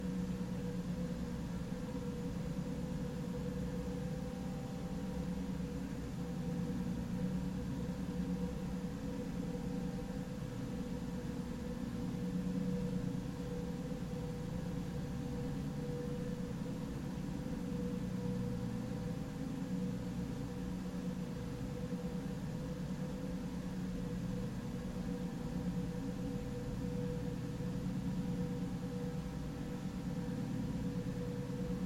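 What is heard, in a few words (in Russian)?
близкий густой гулкий шум работы производства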